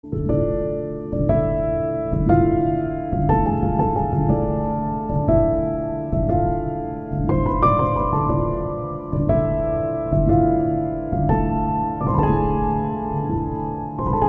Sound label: Bgm; Navarasa